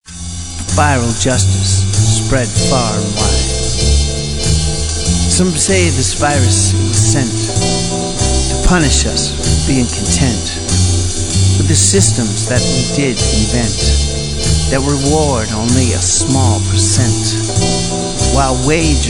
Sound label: Swing keys Horns